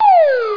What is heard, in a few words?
groan; Learning Games (1995)(Maple Media)